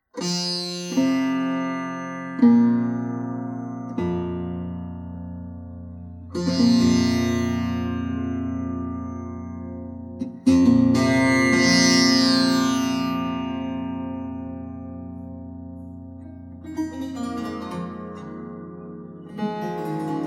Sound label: MultiChord 140cm Tambura Stringboard